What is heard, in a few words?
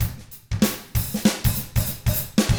95 FUNK, Drums; ILIO; Double Platinum Drums 1